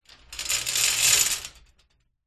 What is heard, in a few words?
industrial chain small movement scrape hoist over metal light room reverb; zapsplat